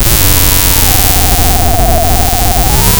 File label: pop